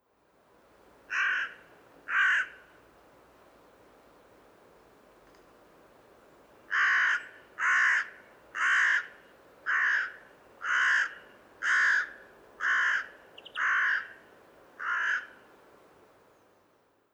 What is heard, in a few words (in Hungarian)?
szurke varju hangja